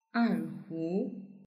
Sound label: erhu; yueqi